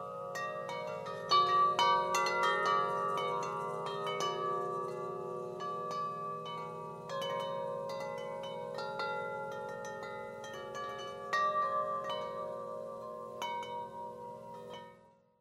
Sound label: church bell 57in; bronze; lambright; bronze series